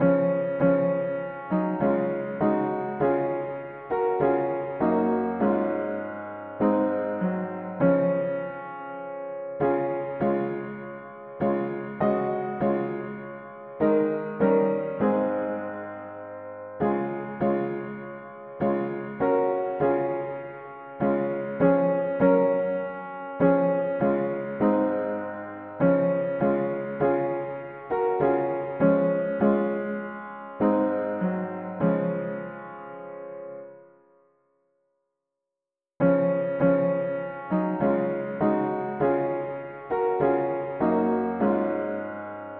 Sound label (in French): mono piano; Digne est Ton nom; hymns